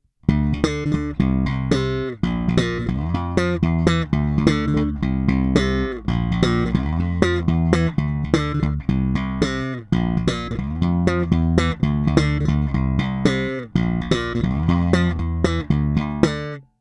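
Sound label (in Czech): Slap na oba snímače, Jazz Bass; Sadowsky NYC Ultra Vintage J Bass Guitar; na oba snímače přes Darkglass Harmonic Booster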